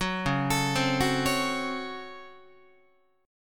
C sharp Major 7th Suspended 4th Sharp 5th, Guitar; C#M7sus4#5 arpeggiated; JGuitar

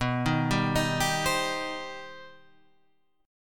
C Minor B; Cm/B arpeggiated; JGuitar

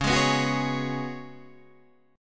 Db 7th Suspended 4th Db down Guitar Drop D

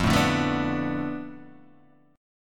F 6th Flat 5th F down Guitar DADGAD, chord

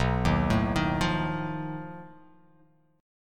B 7th Flat 5th B, chord

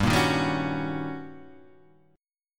Eb 7th Sharp 9th, G

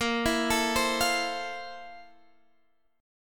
F Major 7th Bb; Fmaj7/Bb; arpeggiated

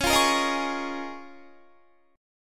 C sharp 6th Add 9th C sharp Guitar; strummed; 21,20,20,20,20,20

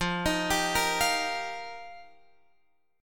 F 7th Suspended 2nd Suspended 4th, Guitar Open F; F7sus2sus4; arpeggiated

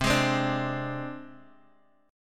C Suspended 2nd Sharp 5th, down, Guitar, Open D

